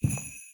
foot; Phasmophobia; Santa

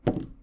Smudge drop; Phasmophobia

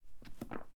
Journal Open; Phasmophobia

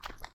Wet footstep; Phasmophobia

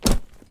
Bag drop; Phasmophobia

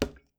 wood; Phasmophobia

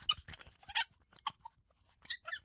Wheelchair push; Phasmophobia

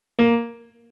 Piano Key; Phasmophobia

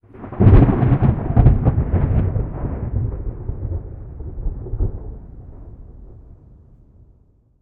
thunder clap rumble; stereo; Phasmophobia

